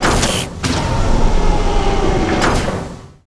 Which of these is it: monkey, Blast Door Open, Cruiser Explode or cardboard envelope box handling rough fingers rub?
Blast Door Open